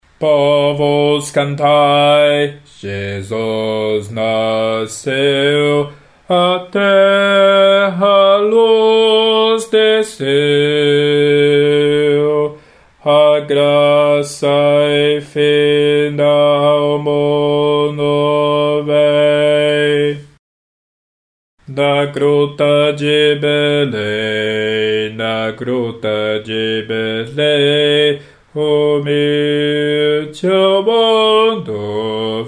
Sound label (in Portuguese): baixo; povos cantai